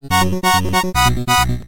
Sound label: Cool Sms Sound